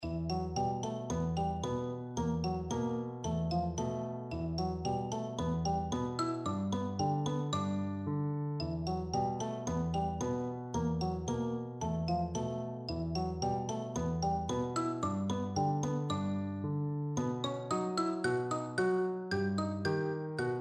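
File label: mountain king xylophone; grieg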